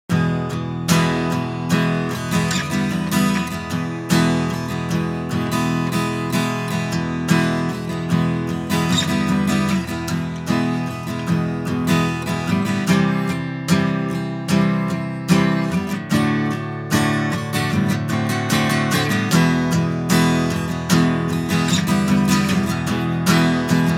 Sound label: SM57 Guitar UNCOMPRESSED